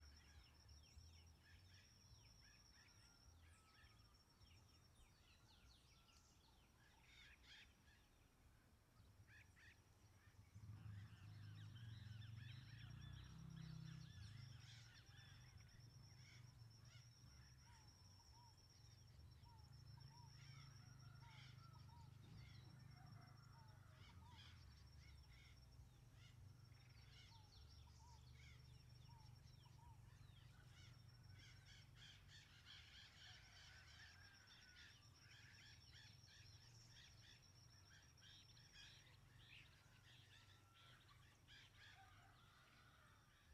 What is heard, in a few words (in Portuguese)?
Ambiencia rural passaros e moto passando longe; ambiente externo